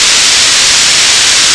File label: gas; HL1-Mesa; shaunsville